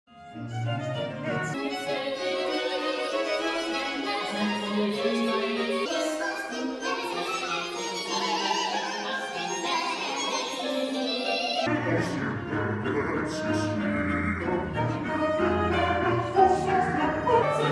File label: innerpitch classical wet; pitch shifting